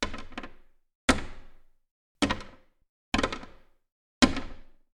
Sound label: Small Object Hit Wood